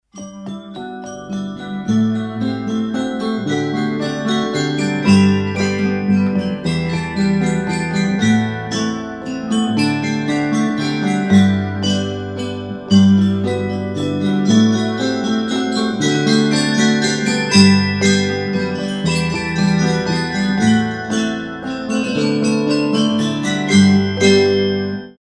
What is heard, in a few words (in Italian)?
Mazurca Vapore, campanari